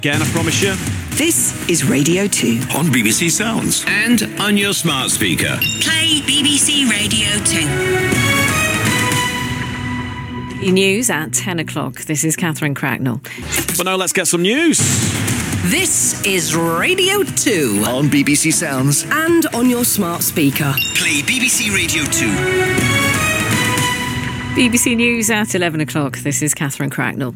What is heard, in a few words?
New Radio 2 jingles